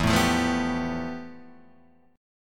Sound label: F Major Sharp 11th E